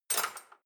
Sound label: dishwasher cutlery place